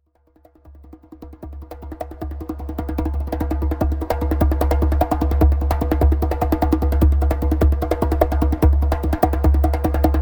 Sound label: djembe heart meditation